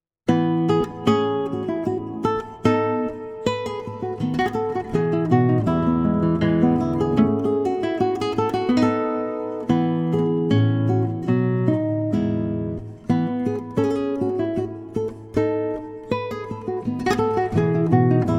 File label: Gavotte